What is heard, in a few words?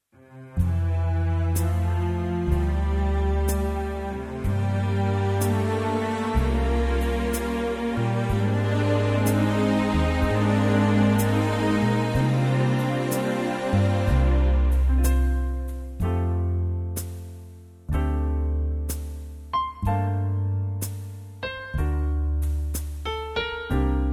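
F, Backing Tracks for Male Vocals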